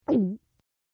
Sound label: Gulp, Eating; Human